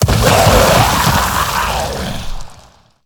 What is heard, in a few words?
Walking Fountain Death